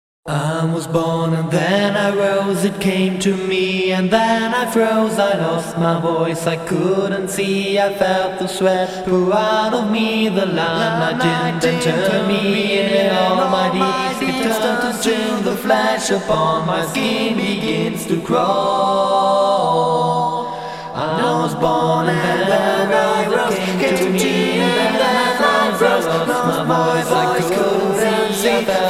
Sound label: boys choir